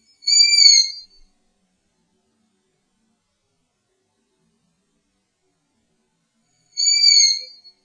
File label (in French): oiseau nuit